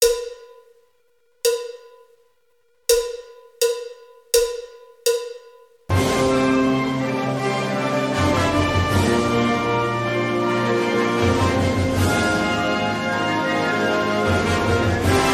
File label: Fanfare Tattoo